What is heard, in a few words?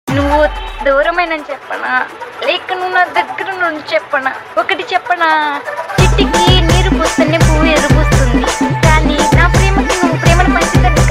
dj bgm Telugu